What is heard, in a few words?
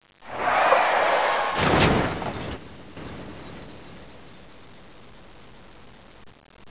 car crash